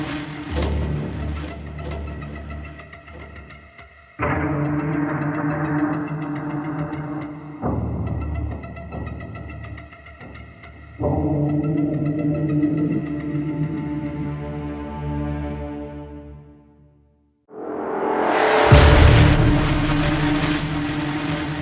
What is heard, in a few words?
Forest Night Choice Music; Warframe; Cinematics; The Sacrifice; Sacrifice Quest